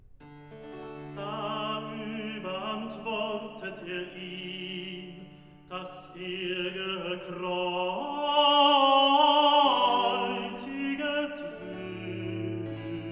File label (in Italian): Recitativo; evangelist Da überantwortet er ihn; Klassika; Handel Masterworks